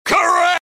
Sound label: correct